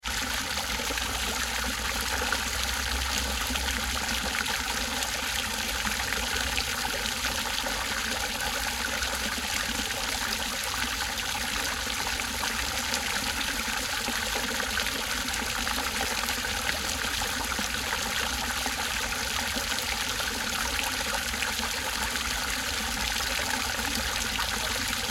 Recreation Park Gardens Large Water Fountain Flowing Bubbling Constant